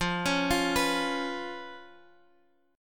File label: Db 7th F